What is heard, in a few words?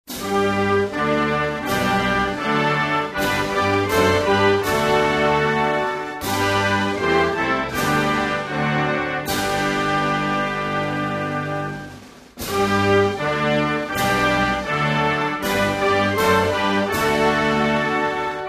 anthem instrumental